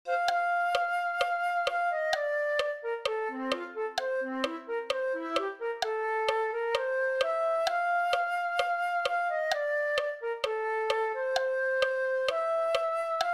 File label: flute